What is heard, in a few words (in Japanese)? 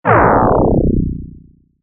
ワープアウト モノラル